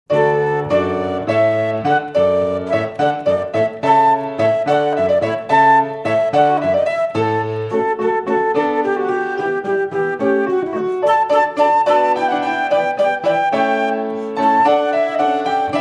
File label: piano flute hornpipe